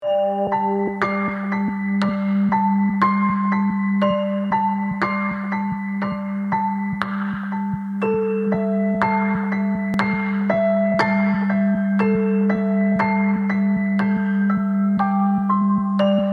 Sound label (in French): musique qui fait peur